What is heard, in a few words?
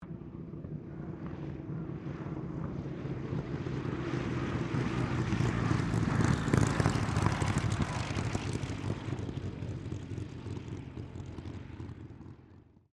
AIRCRAFT SUPERMARINE SPITFIRE MK-XVI LAND; Airplanes (Sound Effects:Vehicles)